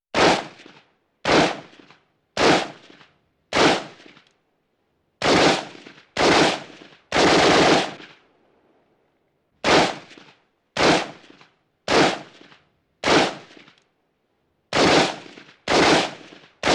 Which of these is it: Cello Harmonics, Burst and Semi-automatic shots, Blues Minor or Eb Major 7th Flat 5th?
Burst and Semi-automatic shots